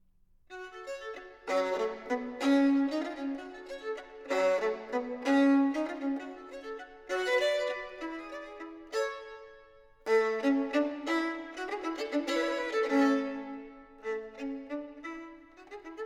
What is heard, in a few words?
Violin Partita in G Minor Retirada. Allegro; Vilsmayr